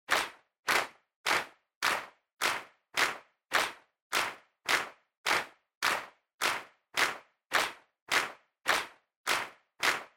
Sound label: Handclap Dry Mid